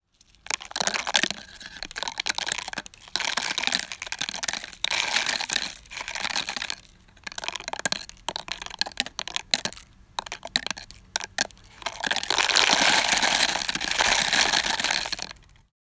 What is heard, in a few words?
Paper Machine